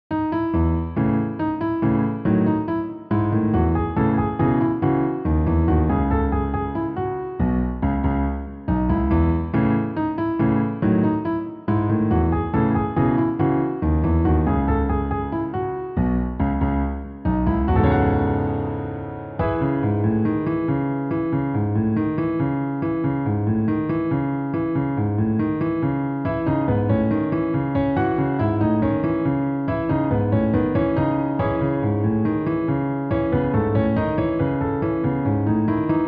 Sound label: Vivace in C minor